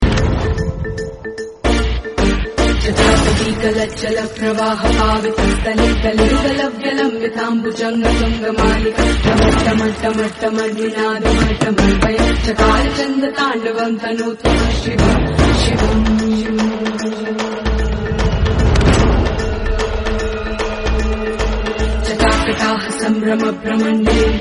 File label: Mantra